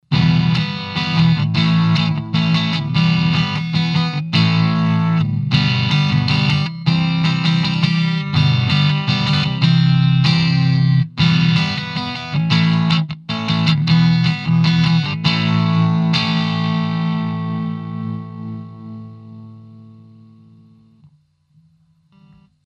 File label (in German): E Gtr Kick on Boost off; Telefunken M82